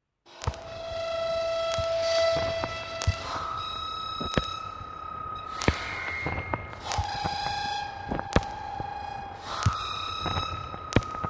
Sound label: electric fence